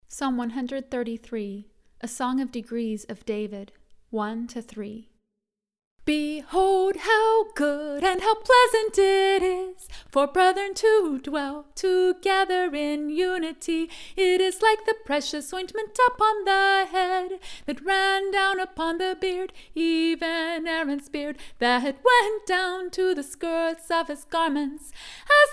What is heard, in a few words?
psalm 133 acappella